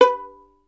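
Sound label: STR violin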